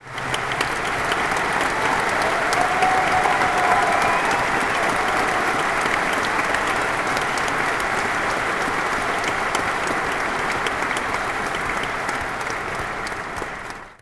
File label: thunderous applause